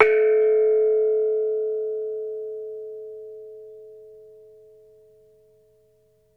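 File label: METALOPHONE, Pitched Percussion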